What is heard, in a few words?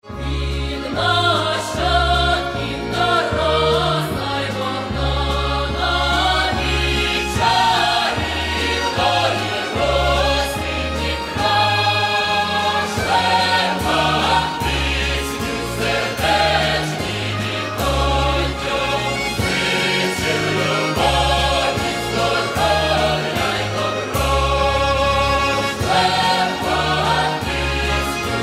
Folk Choir; Cherkasy